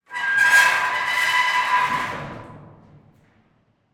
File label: Dumpster door, from inside, door, slide, rusted, screech, grinding, resonant, light; Eiravaein Works; Vaeyan IV